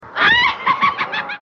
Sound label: witch laugh